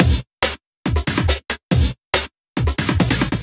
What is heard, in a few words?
Breakbeat 140 BPM